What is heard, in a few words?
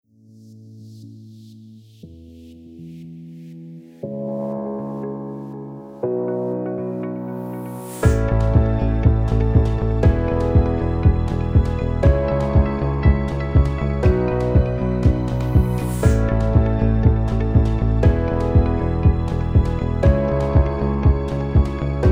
CORPORATE